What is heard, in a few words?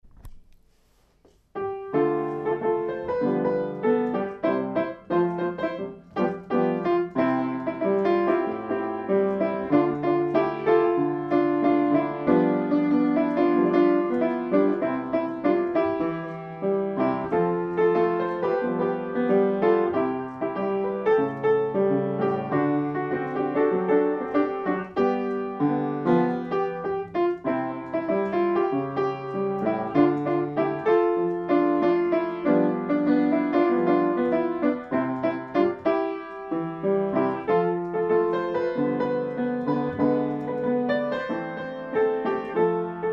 samba piano